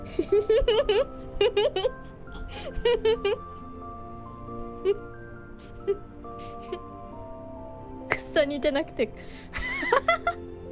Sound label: Laugh; IRyS